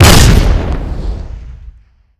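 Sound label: explosion flash bang; Modern Warfare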